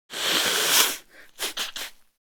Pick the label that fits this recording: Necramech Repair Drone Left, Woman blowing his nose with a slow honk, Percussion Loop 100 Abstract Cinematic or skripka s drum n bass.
Woman blowing his nose with a slow honk